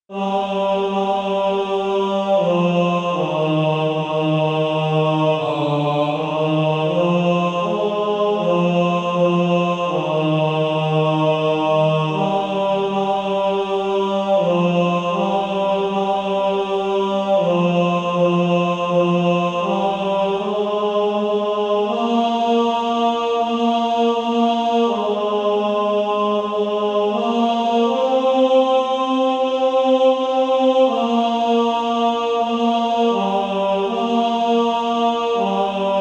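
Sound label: Male Choir, bass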